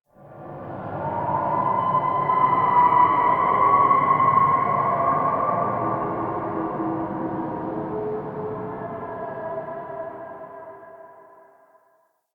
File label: Root Cave Distant Moan; Ambience; Neathuns Root Cave